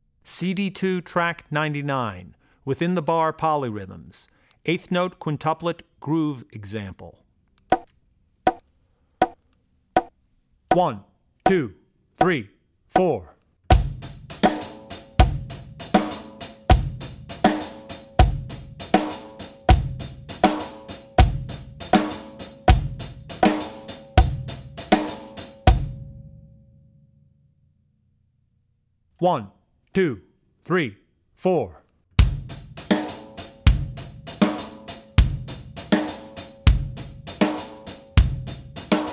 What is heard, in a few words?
Polyrhythms - Within The Bar 8ths; Drummer's Bible Tracks